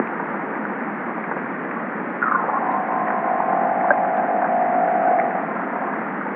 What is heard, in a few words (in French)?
Son Poisson, Sein Acoustic; projet-seinacoustic; Biodiversite